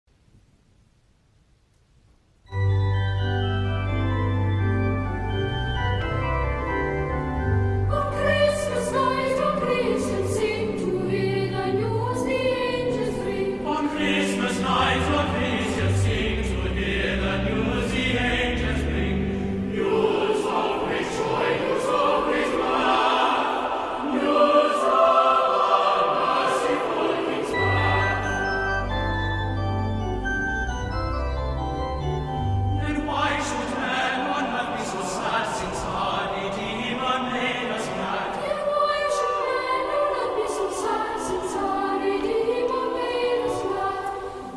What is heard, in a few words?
choir